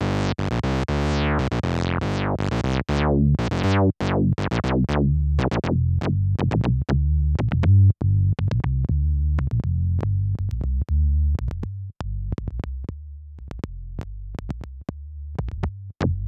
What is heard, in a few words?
bass click autoreso pressure; Clavia Nord Wave